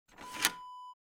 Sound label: cassette out